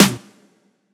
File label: clap snare